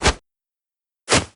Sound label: uncover